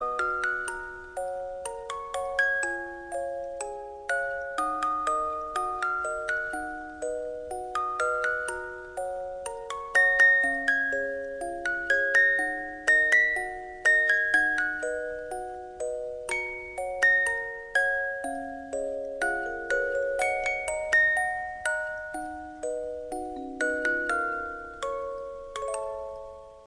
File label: instrumental ringtone